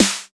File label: SNR, Cheez Snrs